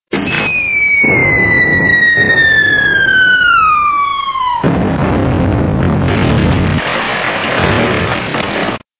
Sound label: Ship Crash